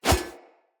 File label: Split Sword Whoosh; Weapons; Swords; Starter Split Sword